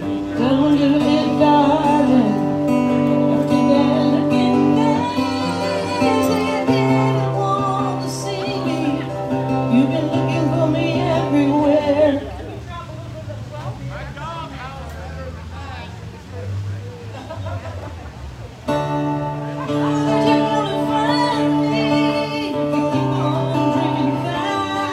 soundcheck

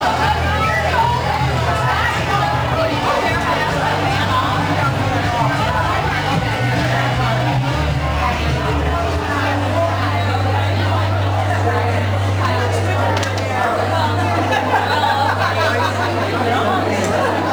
soundcheck

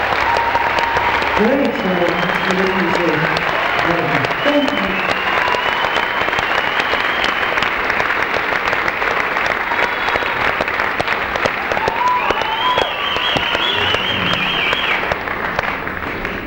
crowd

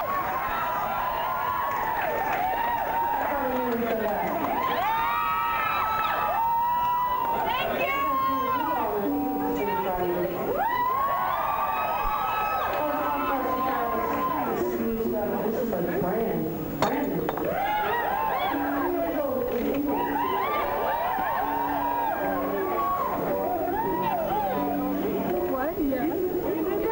crowd

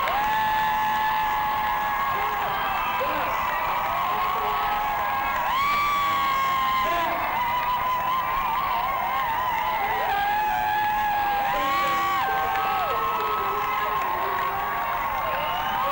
crowd

